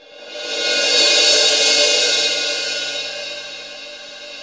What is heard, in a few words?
CYM MALLET